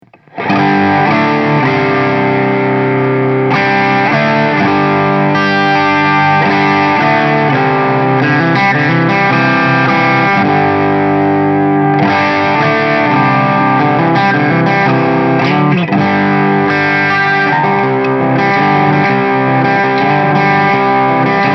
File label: VOX Mini Super Beetle crunch gain full